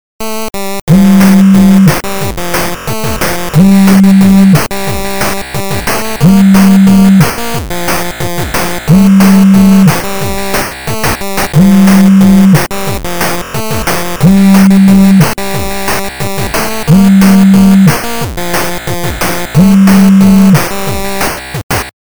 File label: Ringtone; Schwule Raus